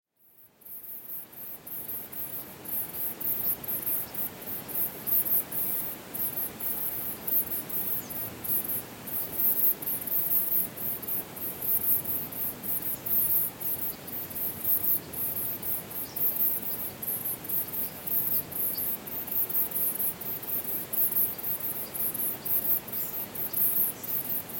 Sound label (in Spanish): Oza Boca del Infierno Refugio Abandonado